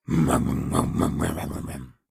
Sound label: Dino growls; SyrinScape; Syrinscape unplugged; Jungleplanet dinolaser battle